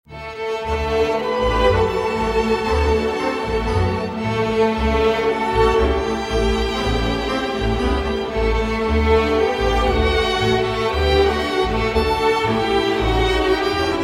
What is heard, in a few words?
tango